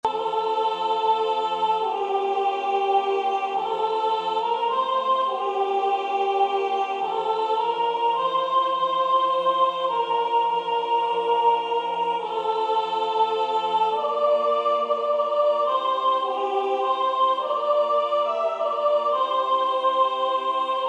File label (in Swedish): Jul jul strålande jul SOPRAN